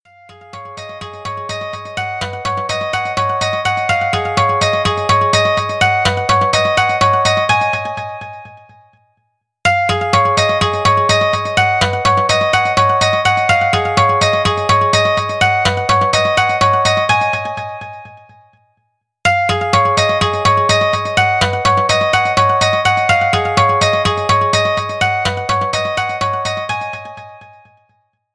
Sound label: Lg Velvet Stock Ringtones